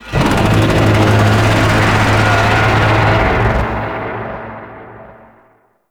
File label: Void Dragon Vocal Idle; Enemies; Vocals; Warframe; Duviri